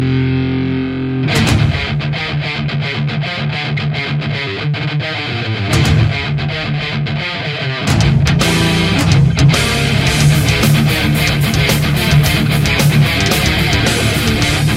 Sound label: metal